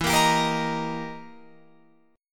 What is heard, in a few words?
E Minor Major 7th Flat 5th, E, down, Guitar, Open F; EmM7b5; strummed